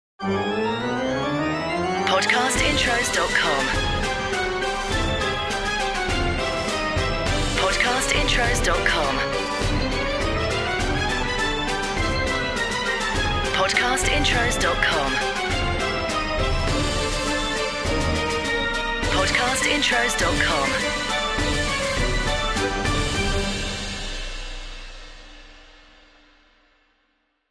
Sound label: Podcast Watermark FUN Big Top